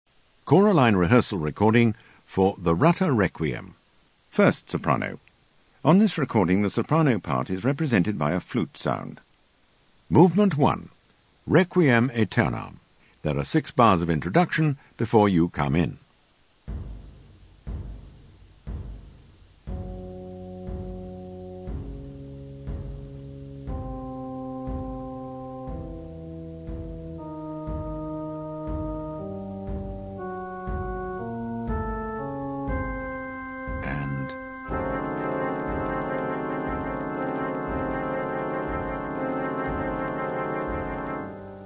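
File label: Soprano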